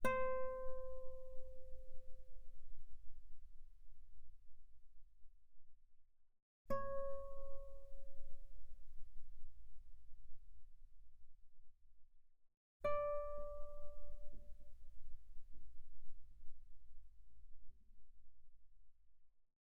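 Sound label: Guitar pianissimo sul B C5 Gb5; mono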